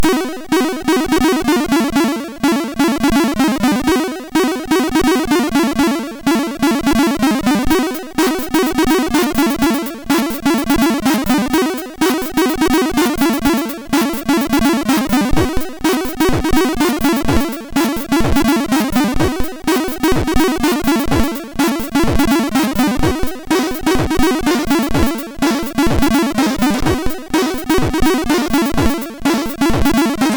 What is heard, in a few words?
Techno; Techno v3; MOS6581R3; hvsc